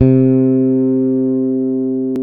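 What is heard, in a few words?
Bass Electric Full C3; CMI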